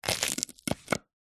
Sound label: pepper single chop with knife on wooden chopping board; food; zapsplat